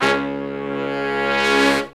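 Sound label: BRS longs, R&R horns section; Roland L-CDX-03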